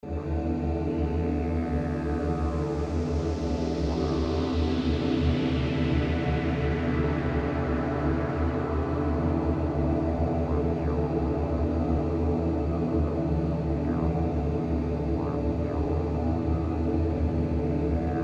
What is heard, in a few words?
Amb Sound Scape Didgeridoo, Ambience chords, African Marimba; Ethnic; Ambient SoundScapes